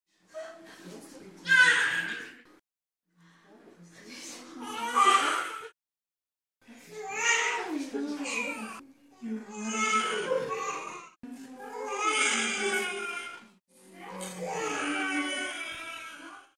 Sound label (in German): Schrei